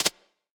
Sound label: jump